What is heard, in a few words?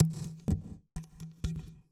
PERCLOOP ETHNO 125